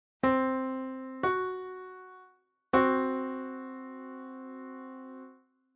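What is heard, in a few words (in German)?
Intervalle übermäßige Quarte C simultan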